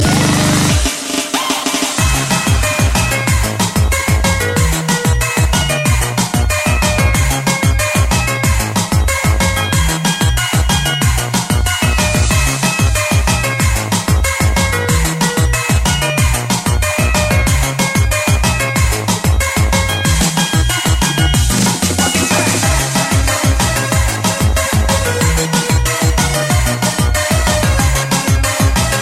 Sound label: Techno Music